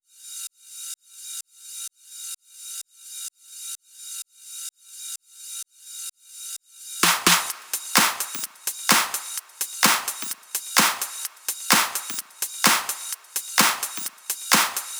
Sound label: Progressive Drums; Mutekki Media; Vengeance Electroshock Vol.2